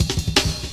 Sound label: Drum Loops, 165.5; Zero-G Jungle Warfare 1, 2 & 3